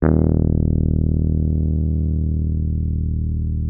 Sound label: Rhodes D#1; Xsample VOL-1; 90 s Sample CDs